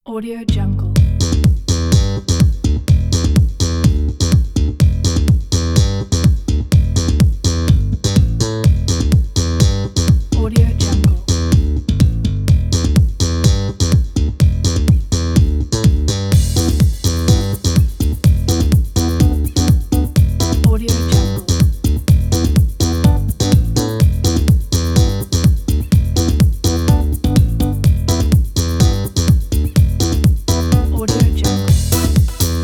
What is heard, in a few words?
slap bass groove